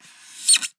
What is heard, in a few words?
Polystyrene Squeak